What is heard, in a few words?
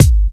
old school natural kick drum sound g sharp key